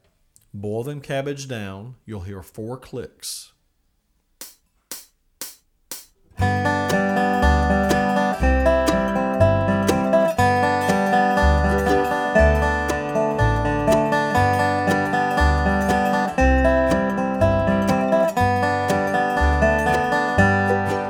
Forward Roll Fast; Boil Them Cabbage Down

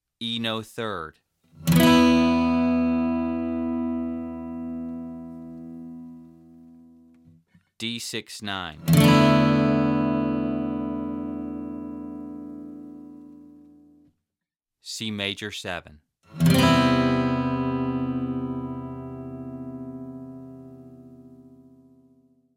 Power Chords Open Strings

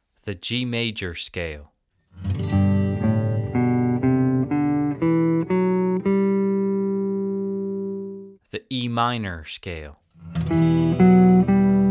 G Major Vs E Minor

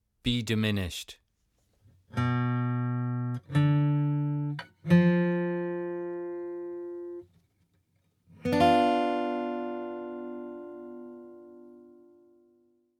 Diminished Chords

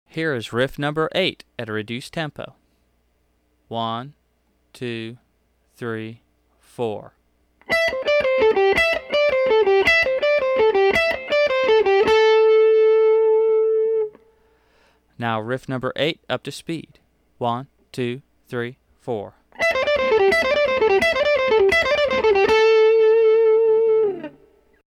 Hot Licks Riff

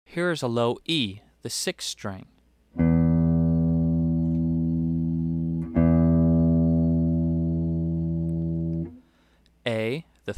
Tuning

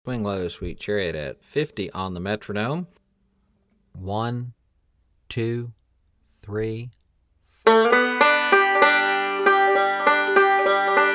50 Bpm; Swing Low Sweet Chariot Break 2

